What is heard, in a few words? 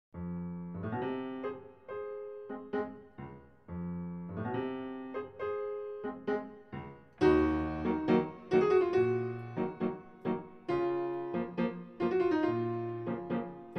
Tango, Piano